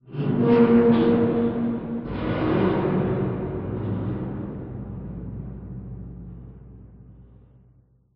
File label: amb apocalypse wronk, ambient city